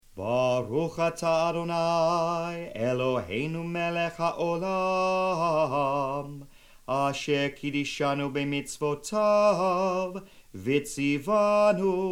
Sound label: Handwashing; passover